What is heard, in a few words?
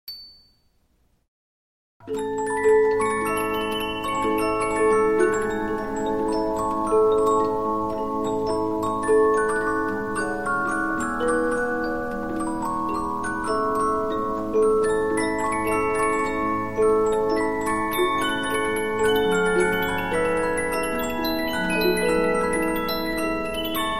Polyphon, 15inch disc musicbox